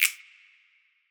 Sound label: Snap Group